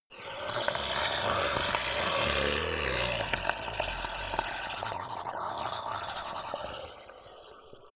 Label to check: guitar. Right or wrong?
Wrong